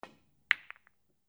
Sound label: billard shot